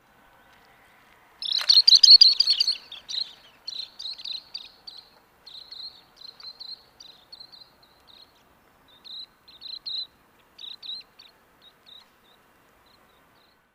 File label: Least Sandpiper Calidris minutilla